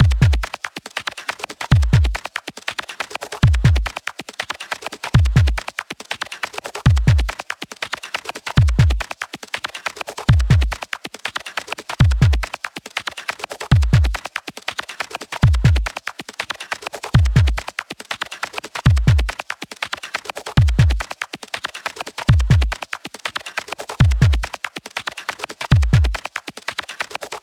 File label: prison track percussion